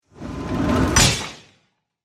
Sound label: Industrial Mech, Mechanical, Machine, Movement, Stamp, Impact; Production, Element, Imaging, Accent, Transition; Factory Sound Effects; BlastWave